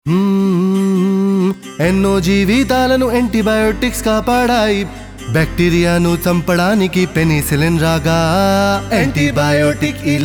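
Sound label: Antibiotic Jingle Telugu; Anitbiotic Jingal; jingles in 19 languages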